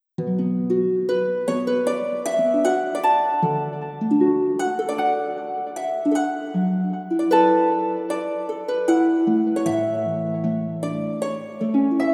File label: Harp Solo Version; kidou sentai iron saga original soundtrack vol.2